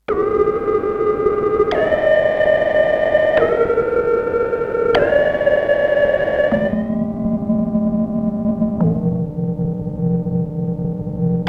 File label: Thudmon, Sequential Circuits Six-Trak; Factory Programs